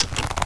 bow draw; cstrike; weapons

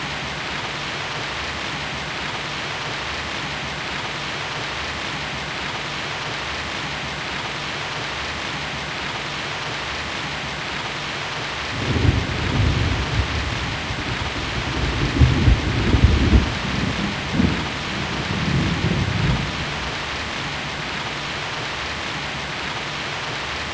rain, ambience; cstrike

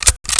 awp clip in; cstrike

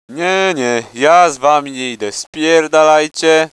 startle; cstrike; hosty

